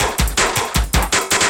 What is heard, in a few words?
LOOP L, Drum & Bass 160 BPM